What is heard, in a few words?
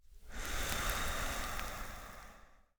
smoke female